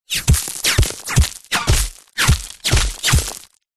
bullets x7 whizz hit ground dirt stones debris close by med; zapsplat